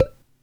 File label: pipe Hit; World Of Goo